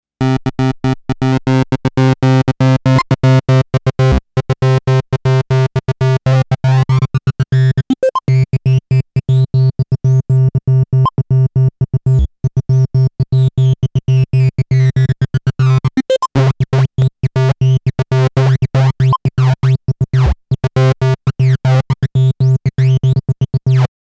Korg EA-1 OscMod Deci

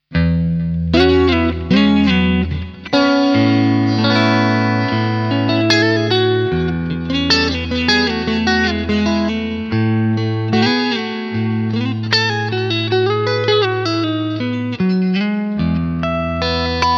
Fender Strat

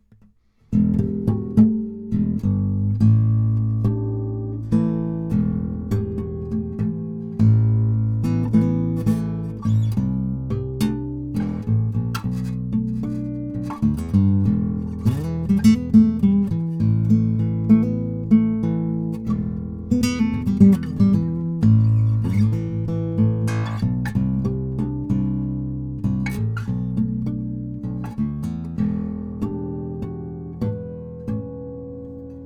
GB72CE Ballad Harmonics Mic Pickup

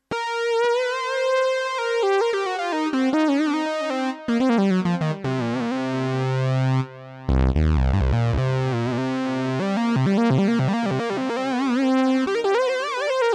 classic lead

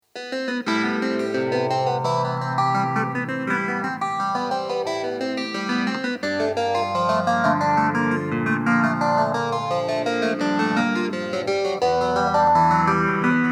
Hohner Clavinet D6 Small-Stone Phaser

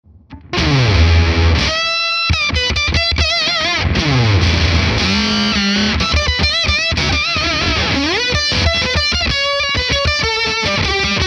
Lead Voice

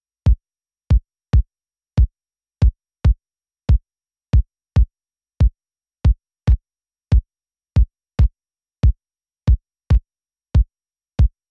Kick

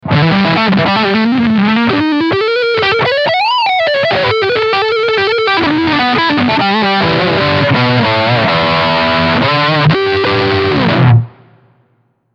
Lick Front Pickup

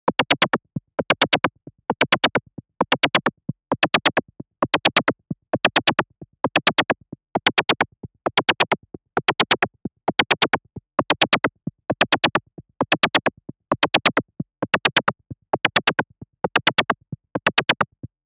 J60 White Noise Zapp Arp

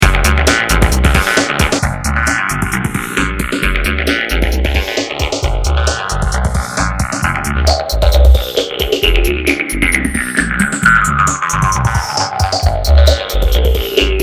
Guit Song Freq Shifter

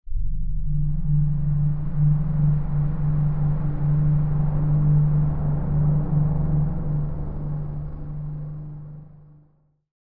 Sound Design Tonal Mapping; Cerberus

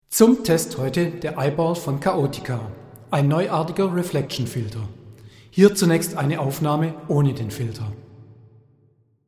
Vox Hall

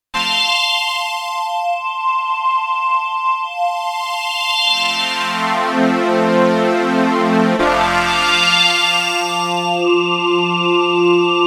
STREICHFETT

